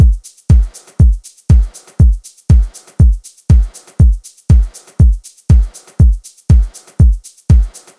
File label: drumloop